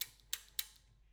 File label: Back Pedals, Bicycle